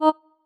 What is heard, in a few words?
marimba oo choir